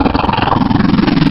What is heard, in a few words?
Crocodile, Wavetables; Cymatics; Serum; Animals for Serum - Gold Edition